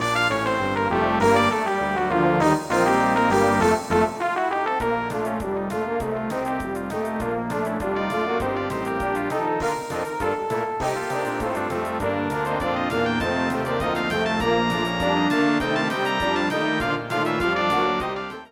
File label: slapdash, brass